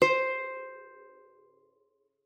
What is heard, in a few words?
C5, GUITAR; Xsample VOL-2